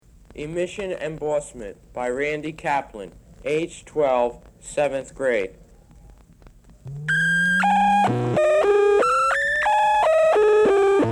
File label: Moog; BL Moog School